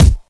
snappy kick single shot b key

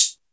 mellow pedal hi-hat a sharp key; Duration 0:01; Channels Mono

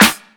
treble heavy snare sample g sharp

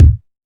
kick drum single shot d sharp key

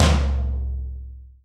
acoustic tom one shot c sharp key

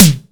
raw tom sound d sharp key; tom drum

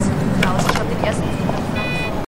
rejected beep from machine

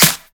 hand clap E key; percussion; single hits one shots

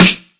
bright snare f key, tuned drums

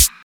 subtle pedal hi hat sound g sharp key

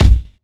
kick drum b key

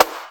sparkle steel snare drum sample b key

